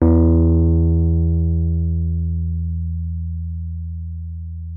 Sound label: Wurlitzer E2; Xsample VOL-1; 90 s Sample CDs; WURLITZER B